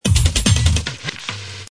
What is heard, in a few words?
drum loop